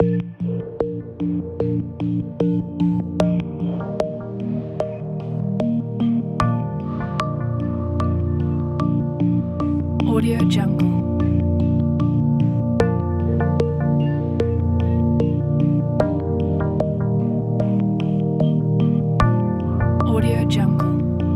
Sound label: abstract inspiring beats